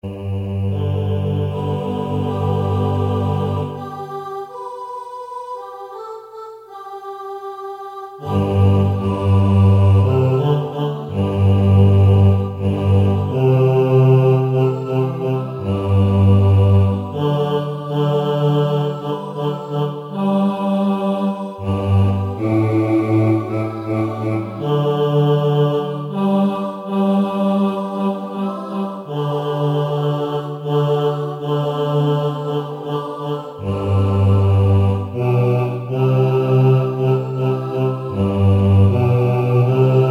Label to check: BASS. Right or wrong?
Right